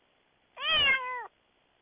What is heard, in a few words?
cat meow